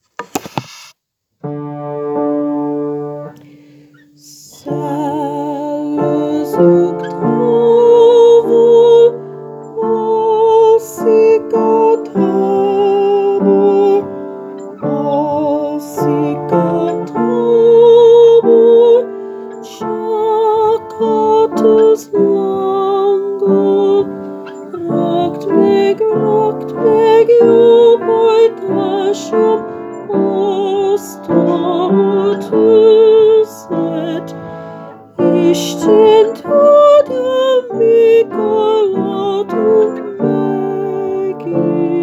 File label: Tenor; Tabortuznel